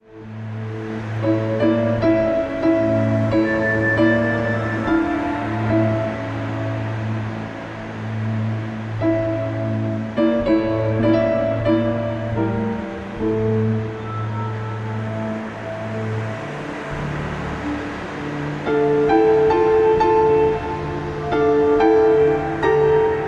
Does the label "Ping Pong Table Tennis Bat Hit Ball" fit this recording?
No